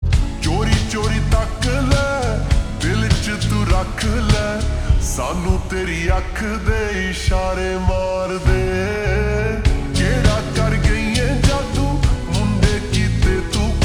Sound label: Ringtone Slowed Reverb